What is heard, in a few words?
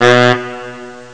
saxophone; lemmings; Amiga Soundtracker Sample Packs